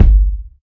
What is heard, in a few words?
smooth bottom end steel kick drum sample g key